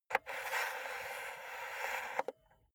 cassette player antenna retract slow

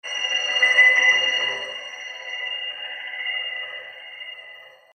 atmo

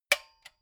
metal button stereo spring click medium

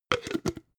jar glass plastic close cap

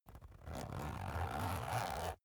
zipper use slow speed up

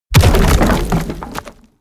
impact medium

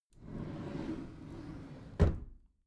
packed drawer smooth close soft

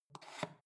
foot switch dimmer slide up

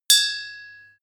strainer hit ring

